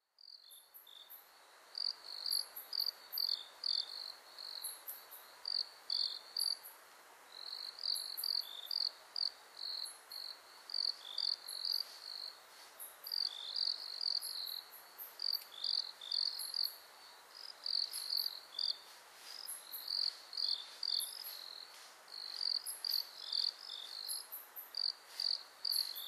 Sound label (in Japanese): koumori